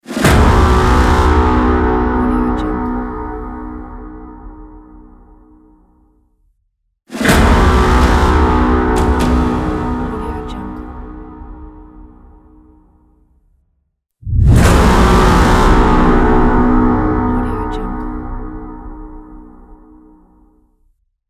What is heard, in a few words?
epic horn